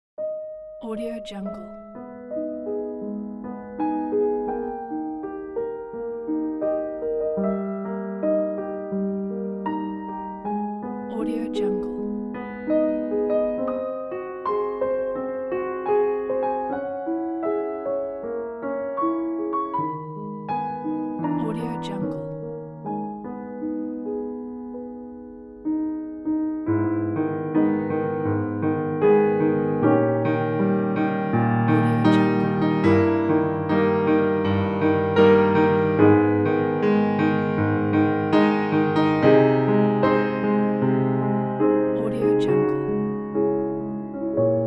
dramatic happy birthday

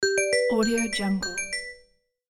success

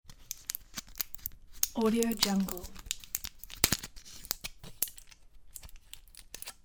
taking pill out of blister

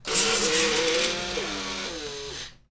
servo a wallow; soundmorph

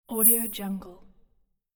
futuristic whoosh

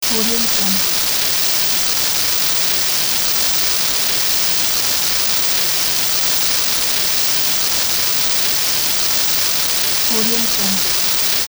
constant transmission interference; sound ideas

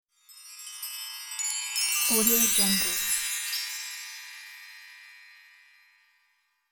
fairy glitter; volkovsound

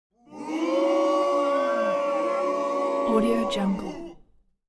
human crowd boo short

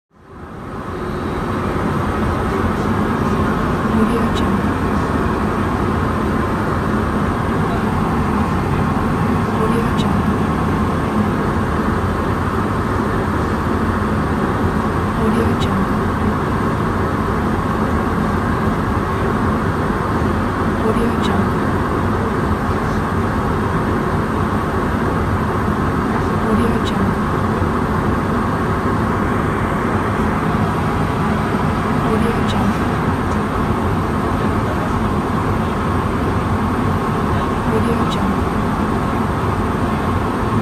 jet airplane cabin noise

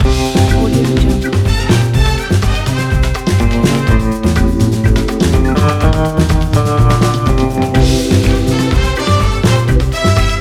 casino game loop

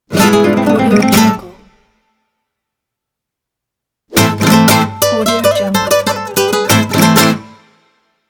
spain guitar logo intro

